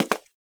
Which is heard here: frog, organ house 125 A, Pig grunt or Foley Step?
Foley Step